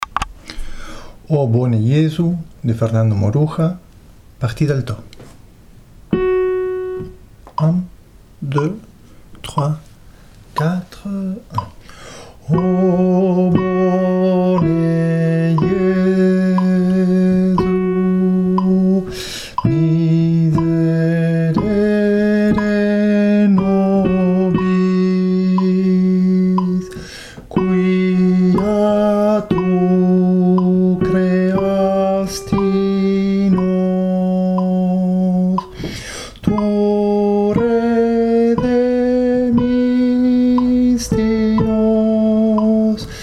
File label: alto